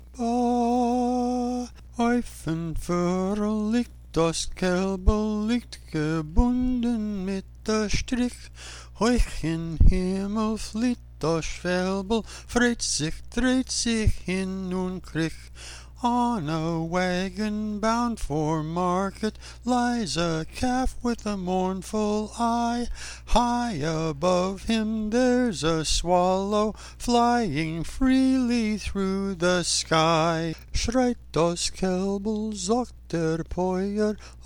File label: Soprano